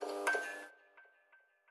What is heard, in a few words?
ambient port; World Of Warships; port3d